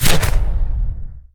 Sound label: p atk machete fire